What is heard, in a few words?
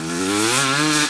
Low, Engine